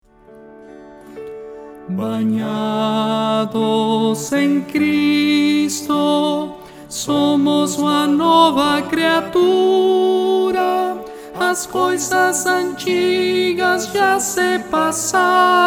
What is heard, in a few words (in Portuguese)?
contralto